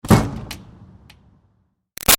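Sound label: Door Metal Commercial Pull exterior Close; Big Room Sound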